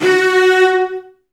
F#3, Virtuoso Strings; TRM FX; ILIO; Kirk Hunter Virtuoso Strings VOL-2; 90 s Sample CDs; 10CL TRM FX

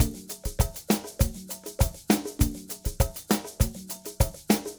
Ensemble, Latin Percussion

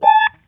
CRYBABY LICK, Funk Gtr & Wah; PlatinumPhattCD2; E-MU Producer Series Vol. 8 – Platinum Phatt

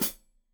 Hat Foot, Hi-Hat, Cymbals Stereo

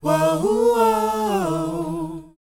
WHOA E A; R&B & Dance; ILIO; Vocal Planet

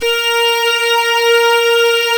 ORGAN, A#; Big Fish Audio; Synclav FM

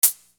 Hi Hat; Sonic Foundry (Sony Creative Software); Infinite Soul VOL-1

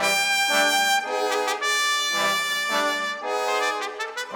Brass; Sonic Foundry (Sony Creative Software); Orchestral VOL-2

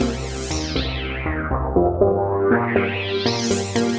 Fuzz Jen B 120 C, modular 120bpm; modular madness

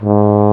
BRS F TUBA, LOW BRASS; Roland - LA Composer vol. 1

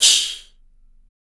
CYMBAL, MALE PERC

